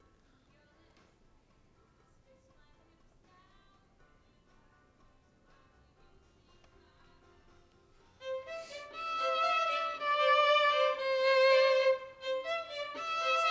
Violin

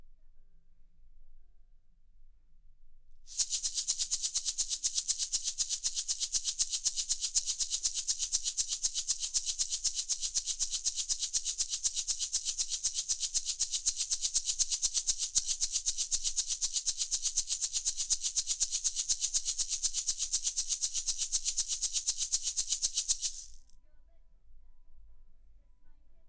Shaker

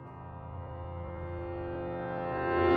PNO

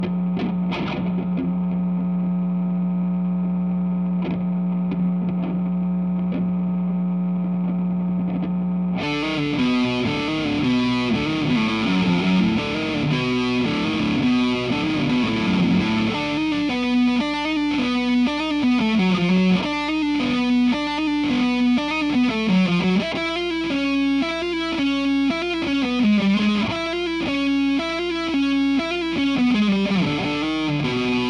GTR

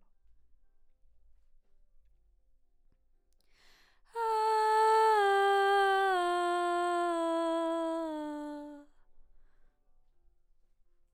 BG Vox

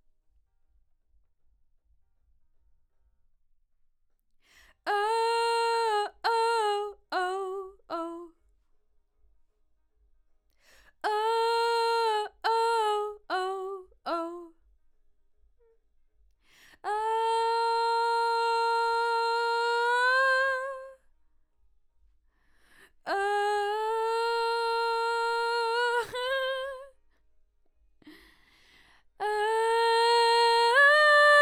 Vox start new

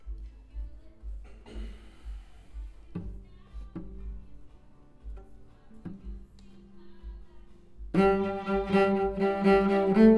Cello

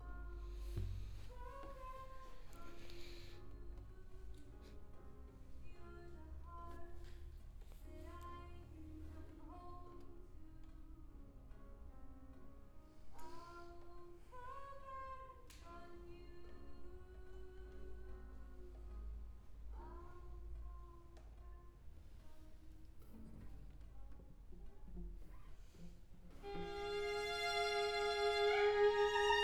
Cello

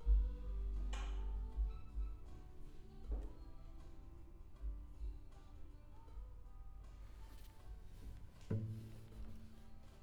Cello

